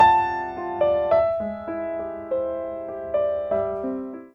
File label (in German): Piano